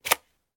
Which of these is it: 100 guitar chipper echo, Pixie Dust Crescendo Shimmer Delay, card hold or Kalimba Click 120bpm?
card hold